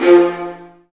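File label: Marcato, Strings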